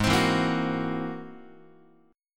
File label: Ab Augmented 9th, Guitar; strummed; x,4,3,2,2,5; JGuitar